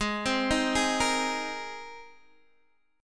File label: Ab Major Add 9th Ab down Guitar DADGAD; Abadd9 arpeggiated; JGuitar